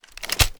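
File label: assault rifle inspect, weapons; gmod; viper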